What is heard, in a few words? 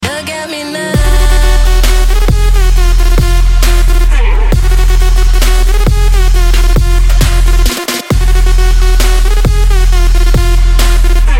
Trap